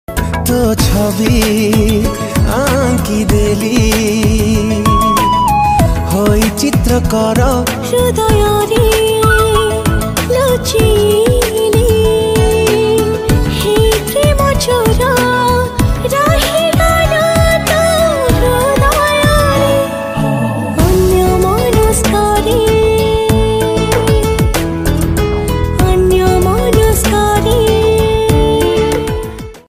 Odia Romantic, Odia Ringtone

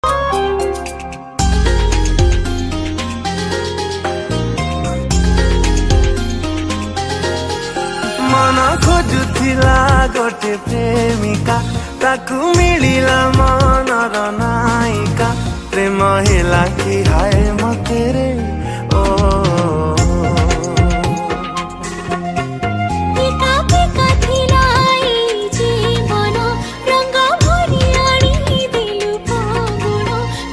Odia Ringtone

